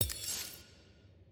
ui page skip do; spirit blossom

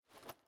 Foley Gear

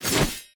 Attack Light; bloom minigame

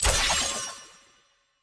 UI Click; Zed; bloom minigame

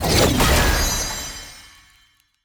Enemy Boss Tormented Shadows Cast; bloom minigame

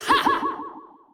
Enemy Boss Attack Light; bloom minigame

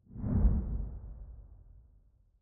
Pipe Enter

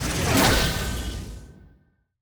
Enemy Boss Teleport Out; bloom minigame

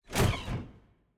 Office Door Open

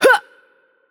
Attack Heavy, ro RO; VO; bloom minigame

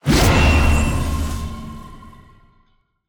Enemy Boss Counter Attack Cast; bloom minigame

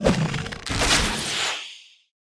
attack heavy cast; bloom minigame

